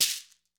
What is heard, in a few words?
SHEKERE, Latin Shakers